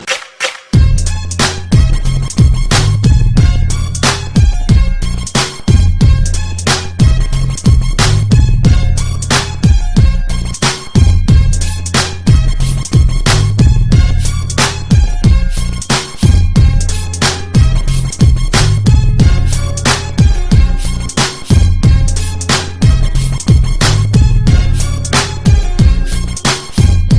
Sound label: black beat, hip hop